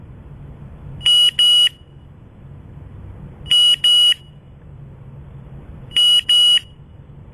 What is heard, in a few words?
double high volume beep